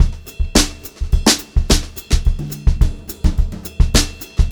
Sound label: HOTROD VARI, Brushed Drum Loops